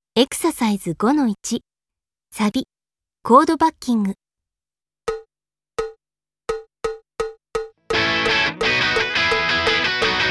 Backing

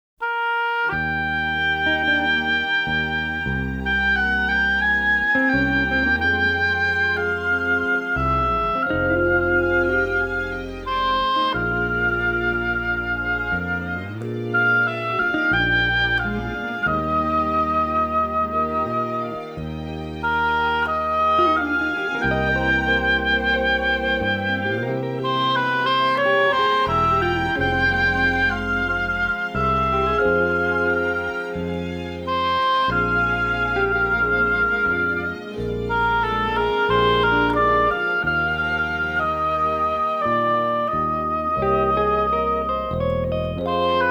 Instrumental; Urusei Yatsura; Complete Music Box; TV Themes

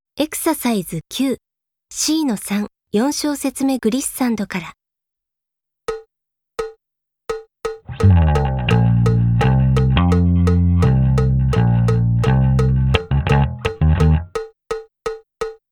Glissando; K-ON

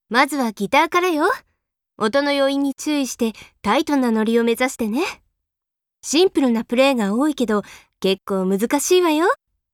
Guitar Introduction; K-ON

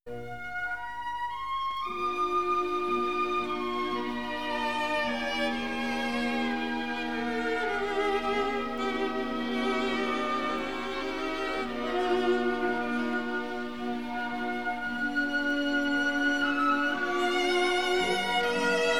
Cello Concerto No. 2; Dvorak; Legend of Galactic Heroes; Free Planets Alliance SIDE

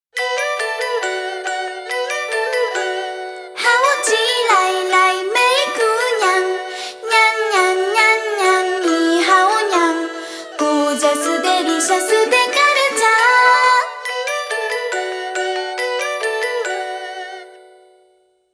Macross Frontier; Vocal Collection Nyan Tama

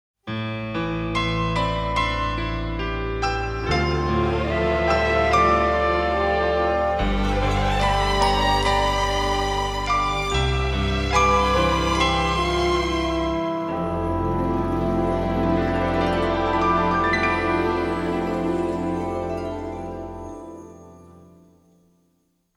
Piano; Neon Genesis Evangelion; Evangelion S2 Works

